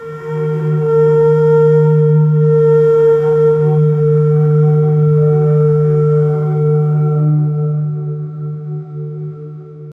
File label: ultra realistic shamanic